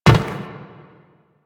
footstep metal; Step Metal, Footsteps; Warframe; Teshin

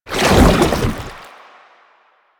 Goo Layer B, Footsteps; Enemies; Soldiers; Foley; Chem Strike; Warframe; Lasrian; Nox

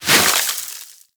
Sword Elemental Ice Whoosh; Warframe; Teshin; Weapons

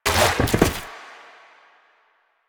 Arcade Dash; Ambience; Volt; Warframe; NineteenNinetyNine

